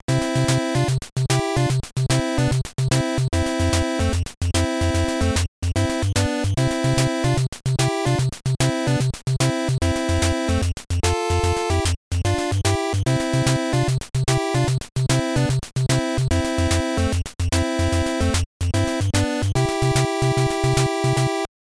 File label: Water Basketball; Double Dare Challenge